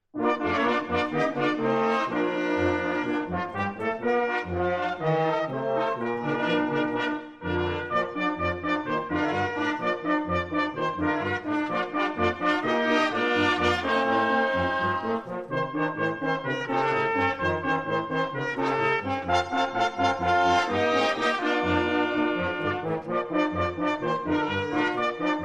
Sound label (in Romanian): Fanfara